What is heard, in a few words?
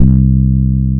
Bass Note C